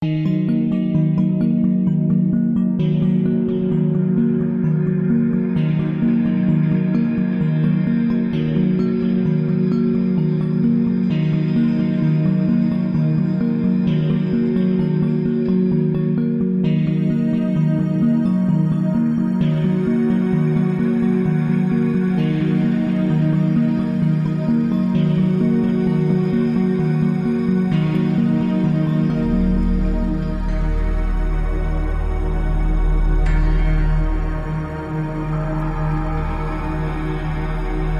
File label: Nirvana Drone Full